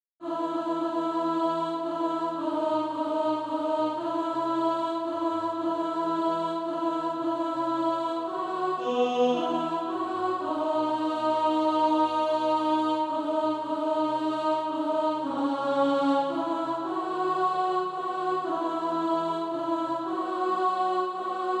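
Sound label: alto, Sheet music (SATB) including Practise Music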